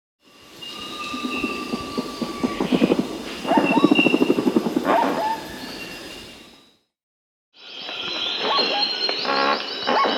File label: Spix's Guan (Penelope jacquacu), Peru; S. America